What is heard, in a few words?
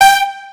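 Lead G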